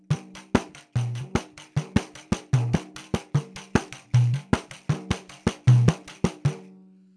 Tamborim et surdo

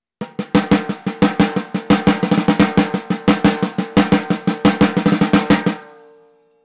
Caisse claire syncope

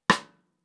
Caisse claire cross stick

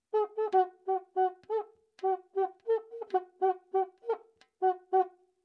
Cuica base

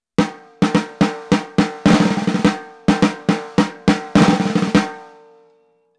Caisse claire marcha

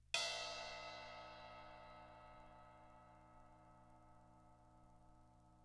Ride tranche; cymbales

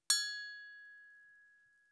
Cloche mandingue grave; clochesmandingues

